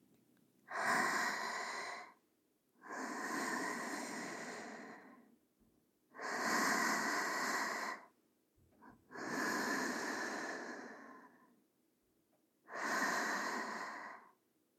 寝息ループ; 効果音あり

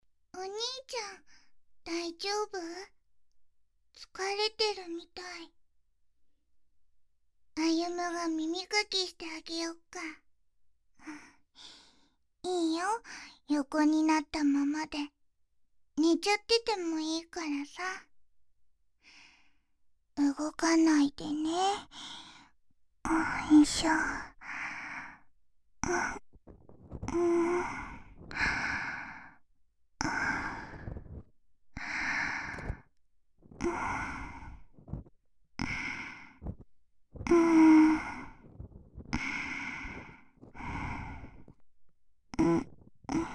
耳かき, ASMR; あゆむちゃんの耳かき

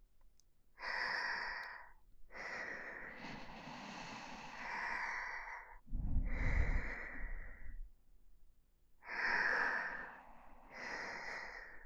寝息; 由真

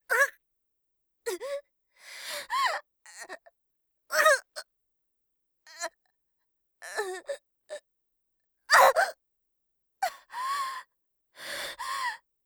腹パン; 効果音なし

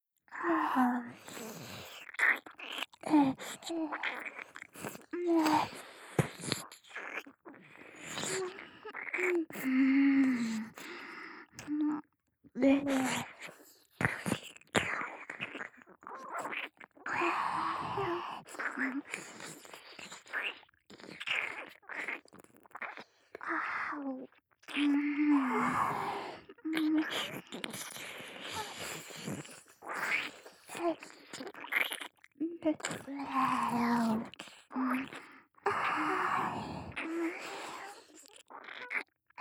両耳舐めループ, ASMR

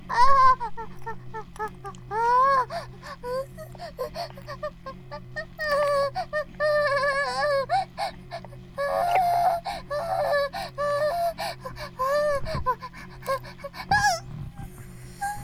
バイブ放置; イクたびにわけて

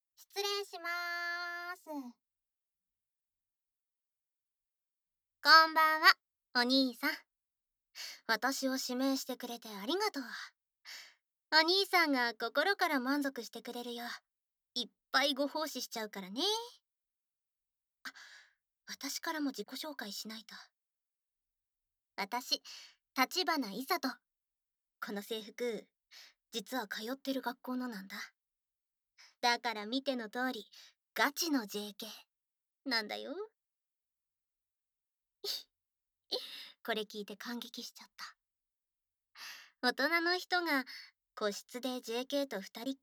耳かき 耳ふー フレンチキス 耳アロママッサージ 吐息, 効果音なし; こんばんは、おにーさん！

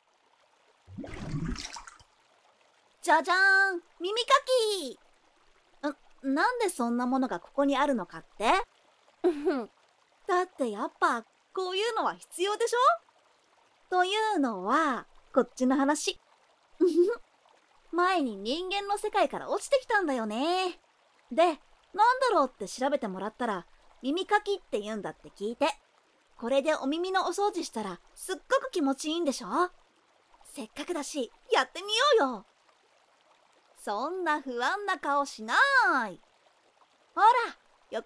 耳かき

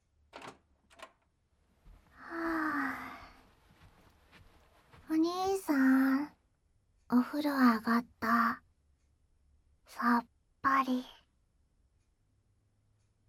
耳かき(右), ASMR; 遅れんぼうのサンタユキさんの耳かき

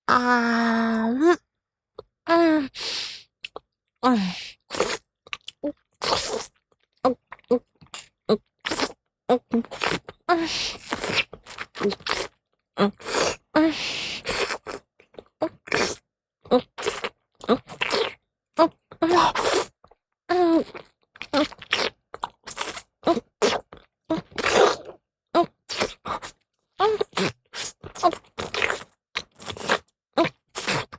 フェラチオ(中):亀頭をしゃぶる; 実用ボイス抜粋集

